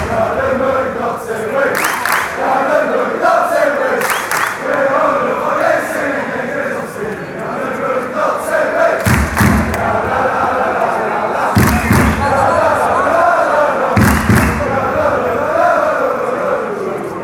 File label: lalala klap, chants